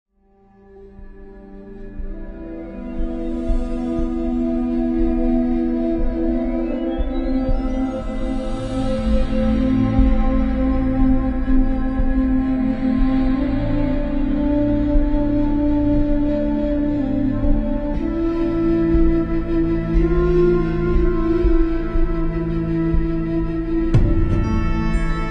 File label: piano